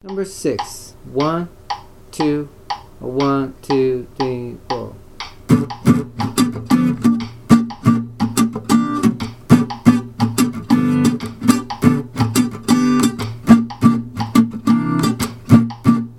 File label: claves clave comping 12 bar blues; original clave